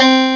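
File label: Harpsichord (C2); X-Wing; X-Wing Patches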